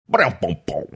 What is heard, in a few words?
incorrect answer sound